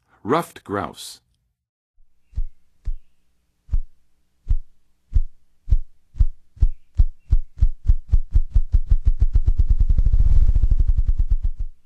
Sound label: Ruffed Grouse; North America; Western USA; Western USA Songbirds